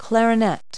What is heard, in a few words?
clarinet; Multi-Lingual Talking Picture Dictionary